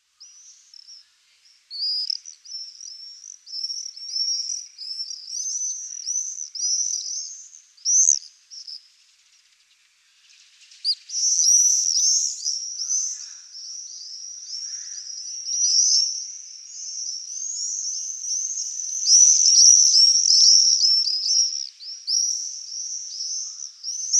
martinet noir

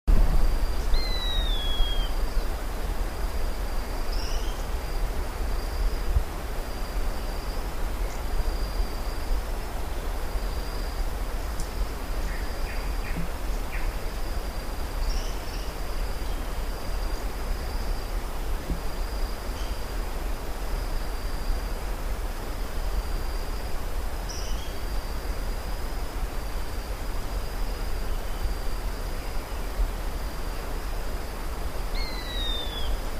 buse a queue courte

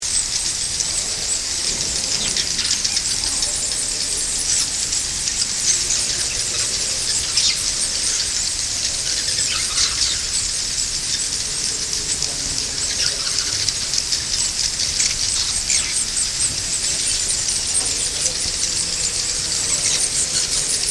salangane a nid noir, chant

